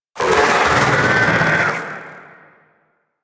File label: Vocal Death; Warframe; Sentients; Conculyst